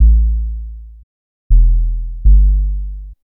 Bass, Futurist Drum & Bass

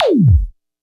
kit, Yamaha Motif-ES8

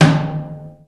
Acoustic Tom

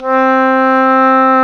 oboe, Roland Orchestral Winds

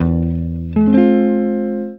EGUIT CHORDS; Best Service; World Colours

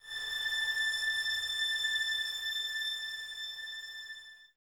violins A 6, string ensembles; Miroslav Vitous

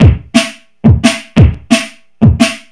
Drum and Bass

